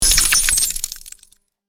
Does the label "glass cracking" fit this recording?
Yes